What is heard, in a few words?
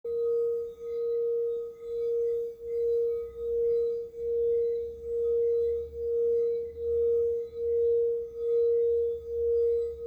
Medicine Buddha Blue A 3rd Eye, glass singing bowl